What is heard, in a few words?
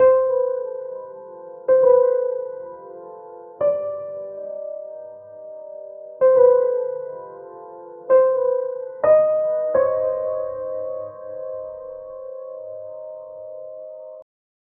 piano in space; ambience